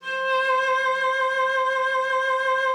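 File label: C5, cello vibrato; Xsample VOL-7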